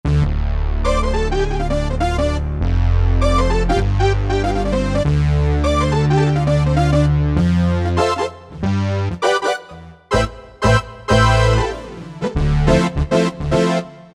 Power Brass, Roland U-220; Factory Patches

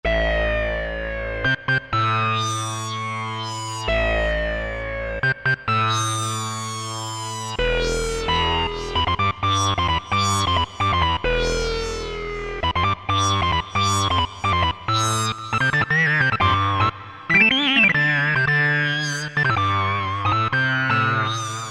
Sync, Cheetah MS6

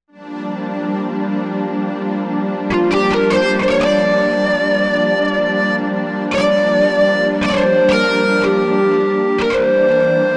Whammy Pad

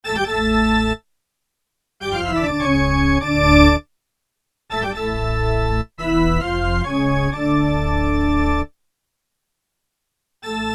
Large Cathedral, t.c. electronics M300

